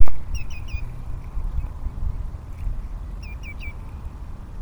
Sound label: Greenshank